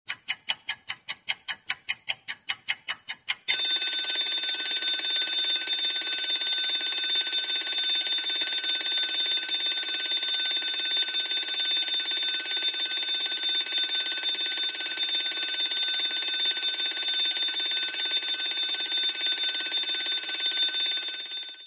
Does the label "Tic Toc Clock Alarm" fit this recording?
Yes